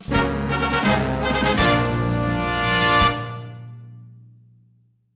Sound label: Fanfare